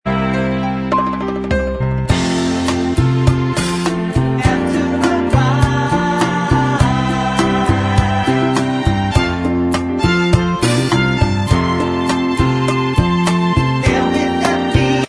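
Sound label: Bvox Track, backing vocals track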